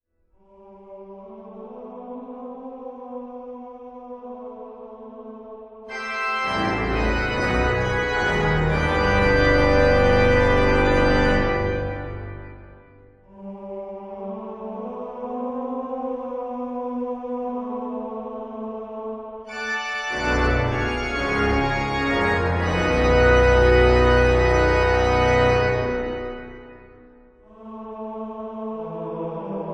e-organ vox humana

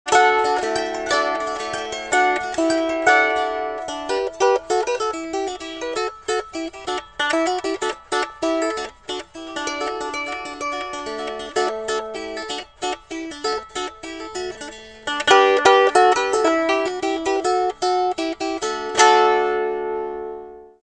Valiha solo

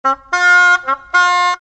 Shawm

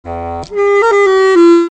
Basset Horn

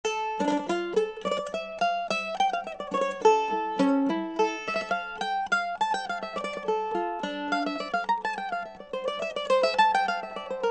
mandolin solo

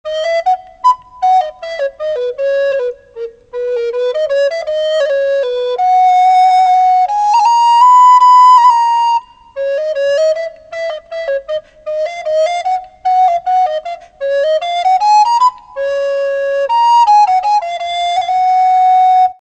Recorder solo

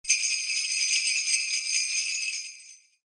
sleigh

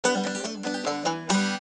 banjo

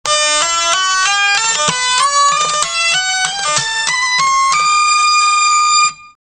Hurdy-Gurdy

